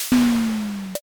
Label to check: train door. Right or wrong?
Right